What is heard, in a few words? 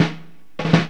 FILL 86, Funky Elements LOOPS; Zero-G